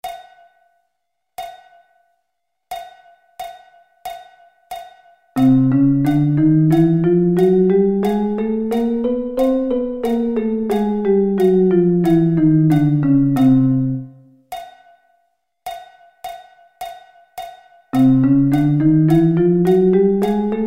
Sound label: Chromatic Scale